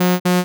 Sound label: timeup; chess shorts